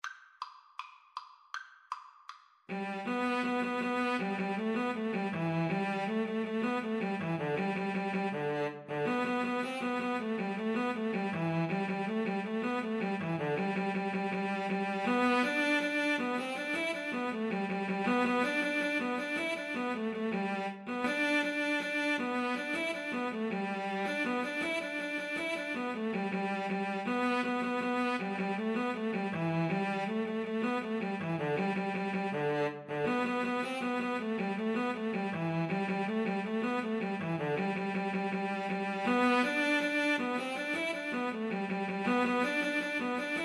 Cello Duet

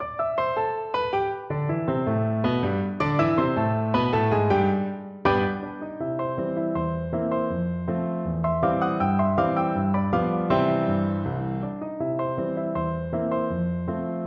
the entertainer piano duet

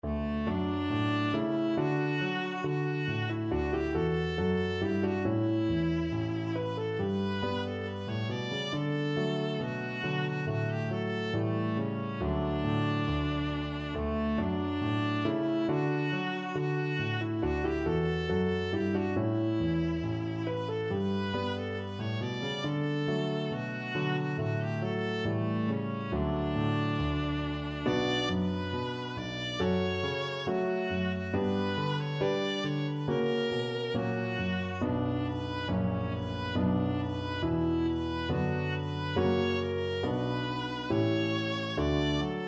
viola

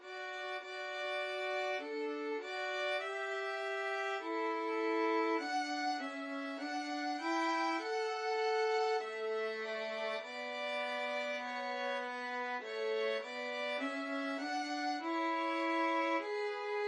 o come violin duet

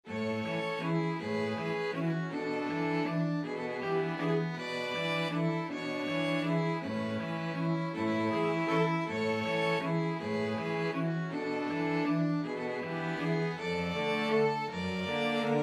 string quartet

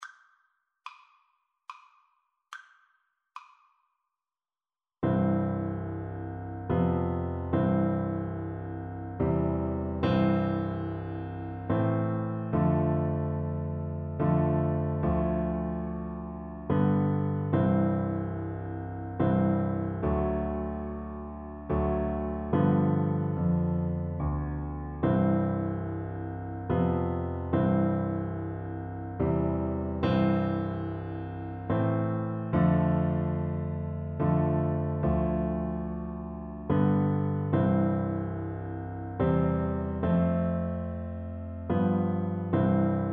Oboe

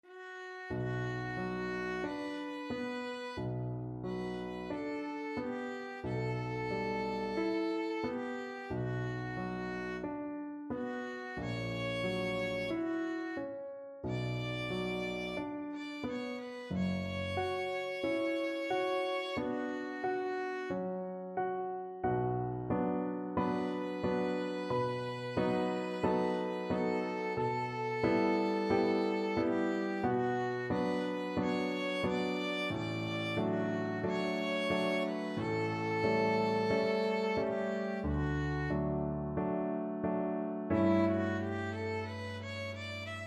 violin

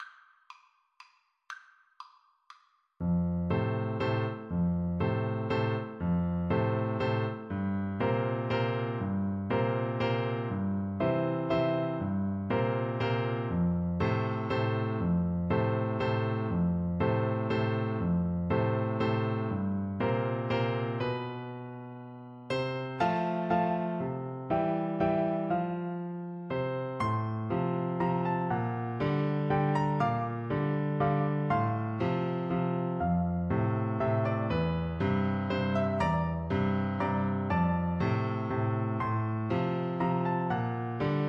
fledermaus, Tuba

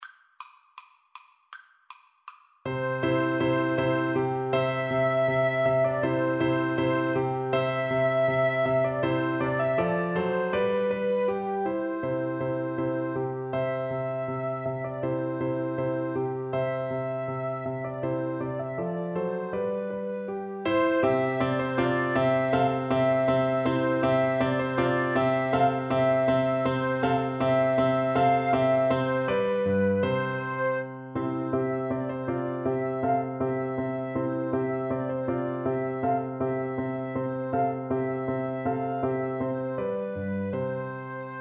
Flute Duet

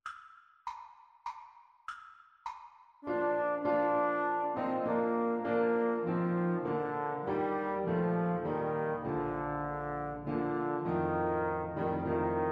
away in a manger, Trombone Duet

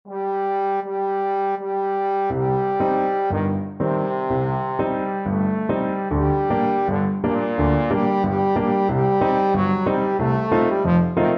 my gypsy girl, trombone

